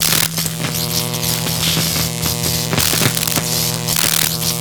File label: electric spark loop; ambience